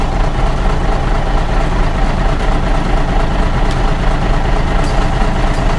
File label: bus, ambience